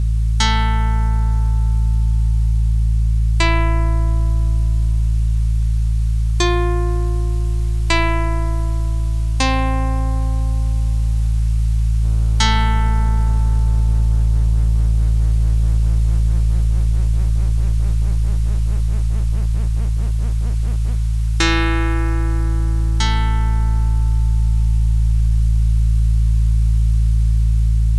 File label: outro